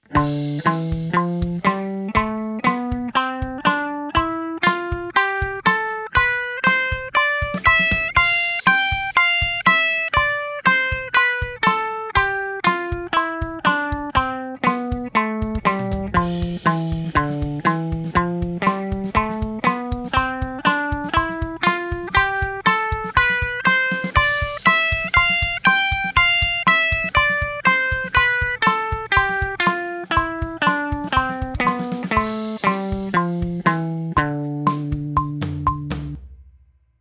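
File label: A minor #4 Dorian 120 bpm quarter, guitar; a minor diatonics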